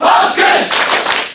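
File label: Cheer Japanese; Fox; Brawl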